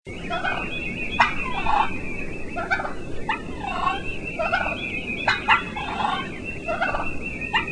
turkey cluck and purr

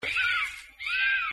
Grey Fox Barking

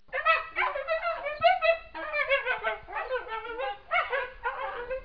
coyote

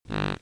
doe grunt

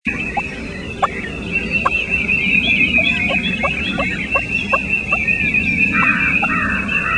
turkey tree call

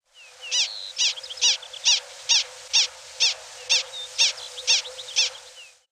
Prairie Dog Distress

